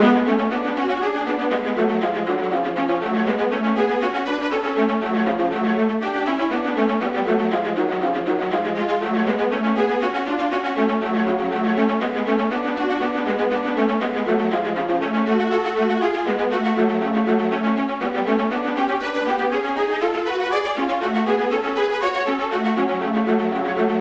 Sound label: viola